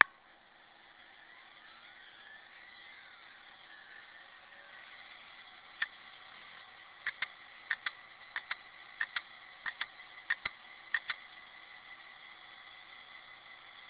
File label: Maxtor 53073H6, hardware